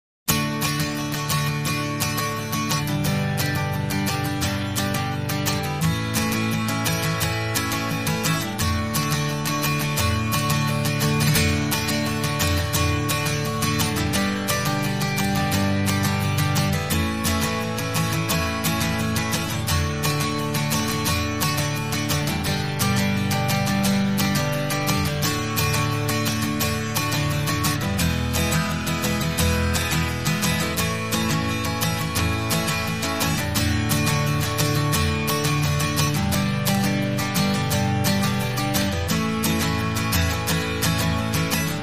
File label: Guitar Strums